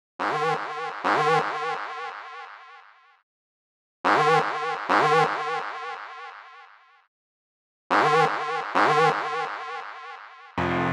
neuro dnb boss battle

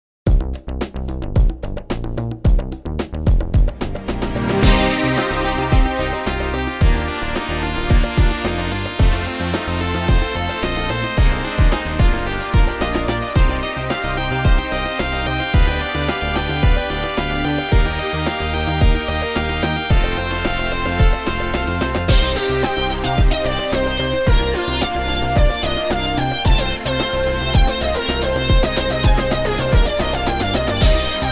anime synth rock

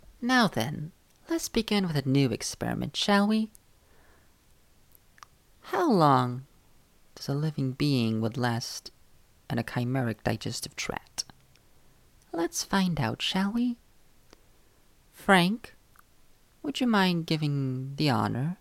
mellow

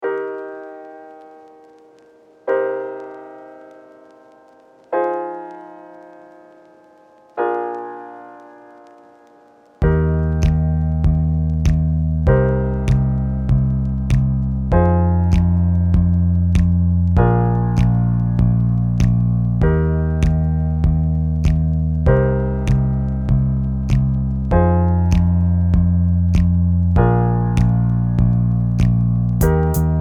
lo fi hip hop

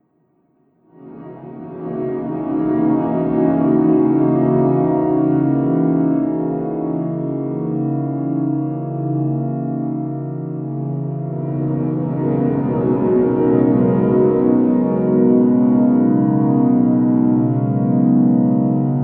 serene view from a kitchen table

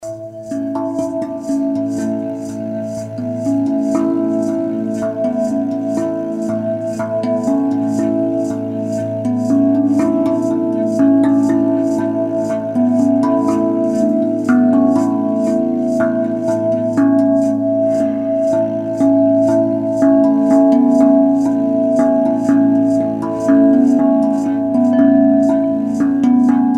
falling water

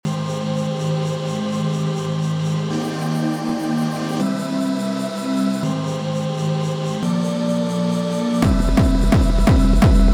220 heartbeat

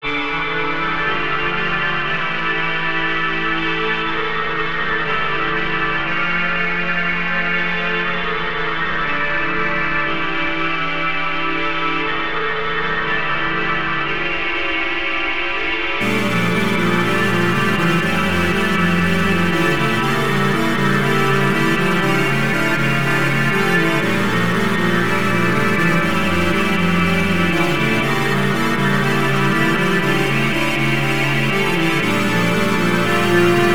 pixel bridge

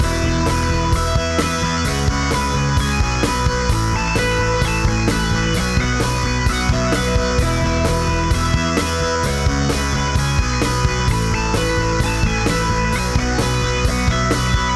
hardcore test

